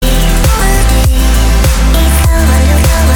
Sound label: (Acoustic ver.)